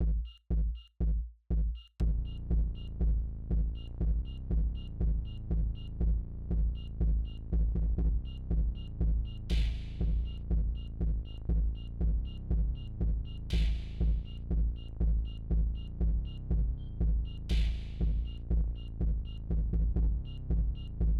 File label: Sine